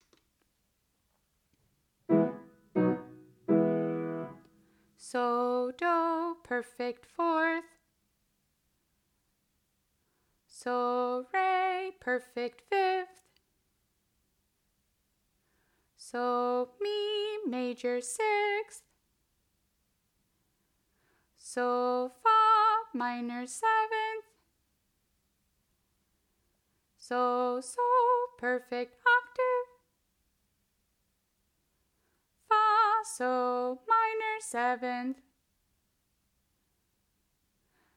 Low sol intervals

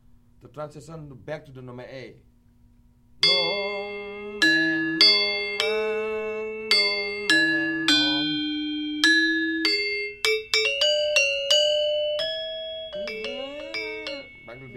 Lengker Transition Polos, gamelan

